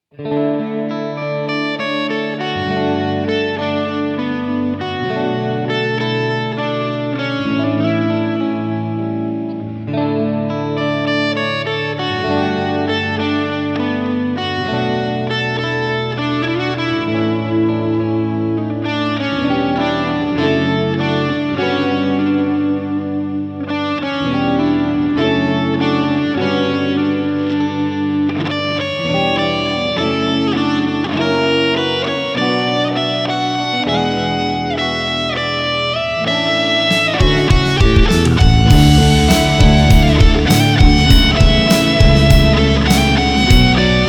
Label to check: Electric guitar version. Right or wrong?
Right